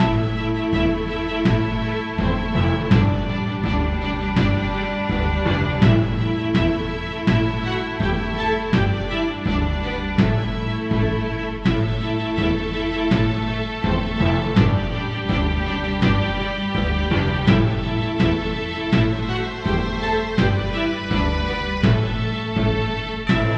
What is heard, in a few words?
Pirate Style